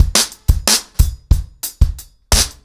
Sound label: 90BPM, acoustic drums, drumloops